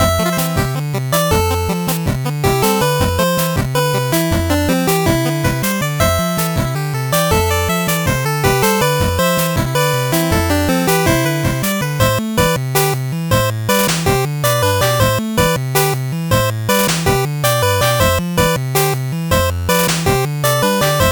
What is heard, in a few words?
8bit bgm slow